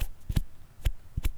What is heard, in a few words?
Tap the Melon; Micro Pack